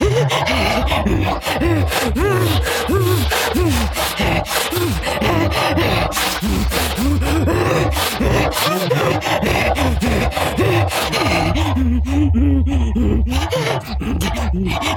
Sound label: Chase Medium